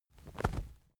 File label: wing flap leather single; natur-tiere; voegel